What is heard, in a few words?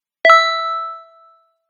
text receive